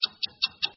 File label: countdown; mahjong; yixing